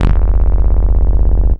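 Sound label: BASS OBMX, A8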